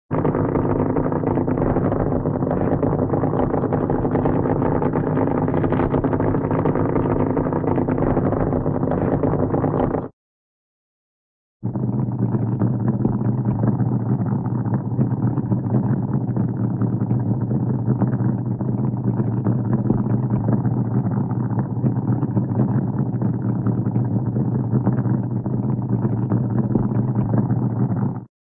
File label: auto, race, dragster hot idle; cartoon